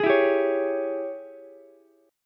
G sharp Major 7th Suspended 4th Sharp 5th, Ukulele; JGuitar